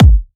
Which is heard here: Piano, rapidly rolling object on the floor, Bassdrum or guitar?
Bassdrum